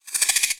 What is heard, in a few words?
Warrior Attack Miss; we are the dwarves gamerip 2016